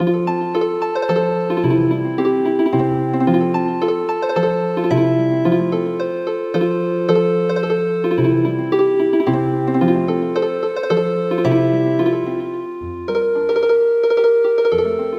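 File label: Healing Harp; Hooray For You Who Turn Your Year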